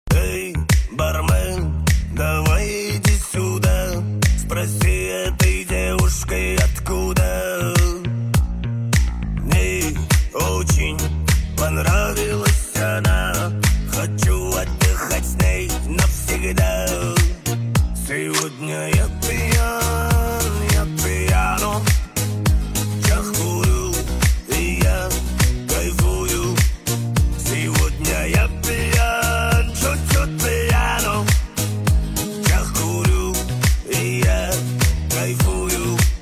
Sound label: piano